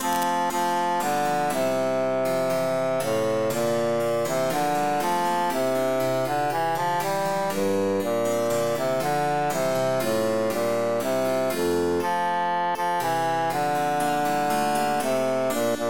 bassus emphasized; silver swan

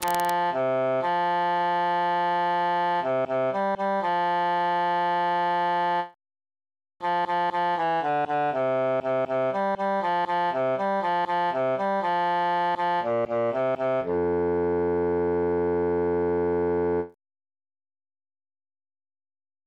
bassus solo; psallite unigenito

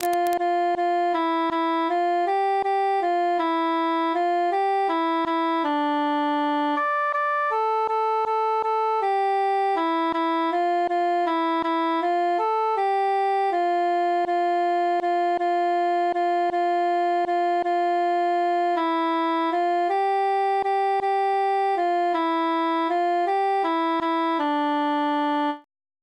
cantus solo; dindirin dindirin

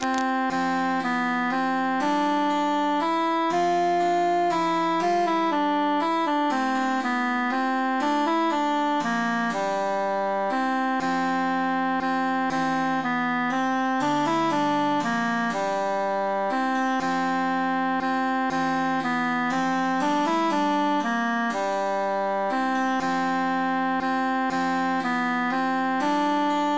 altus emphasized; post partum virgo ave regina veritatem

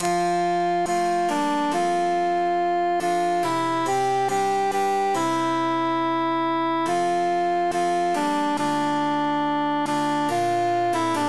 altus emphasized; tant que vivray

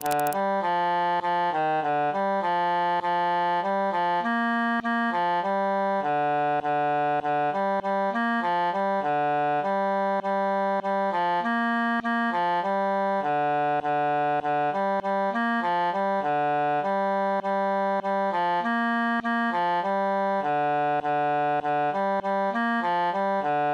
bassus solo

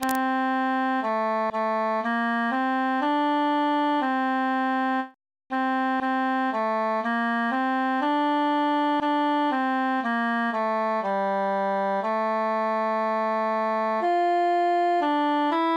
tenor solo; o occhi

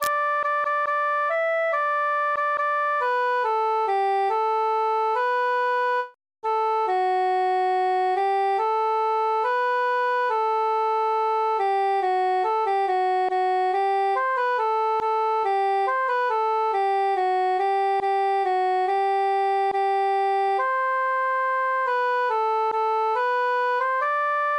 cantus solo; come merry lads

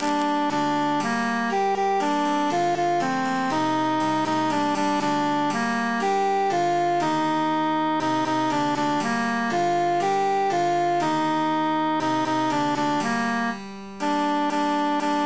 altus emphasized; la la la

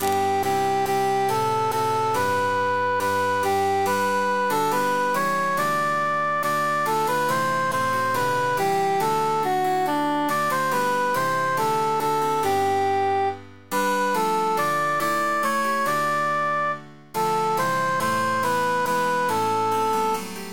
cantus emphasized; now is the month of maying

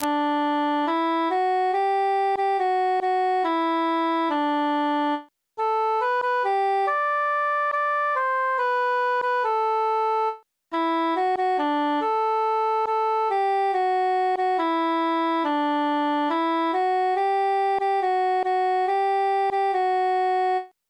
cantus solo; non nobis domine

